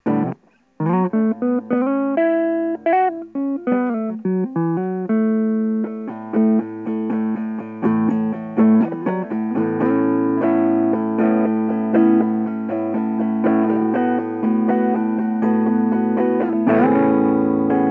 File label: kids electric guitar